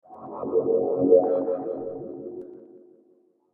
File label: Inside The Laser Chamber, sci fi